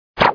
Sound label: stab; SpecOps2 MPlayer Demo